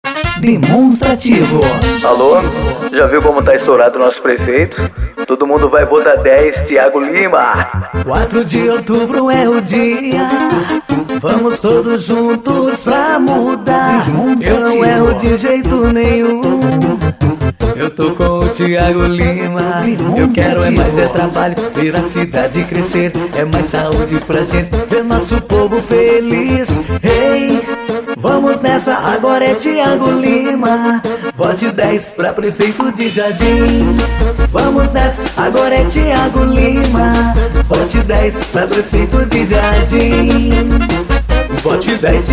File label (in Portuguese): jingles politicos; Studio Lako